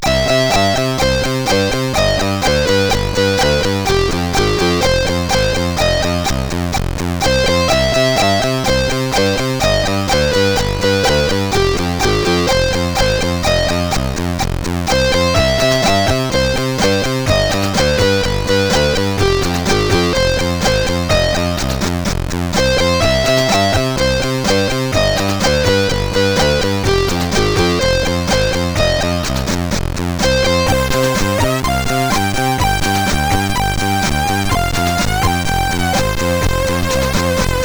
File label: Tracker, CSG8580R5